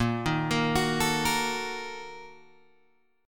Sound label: A sharp Major 7th Suspended 4th Sharp 5th, Guitar Open G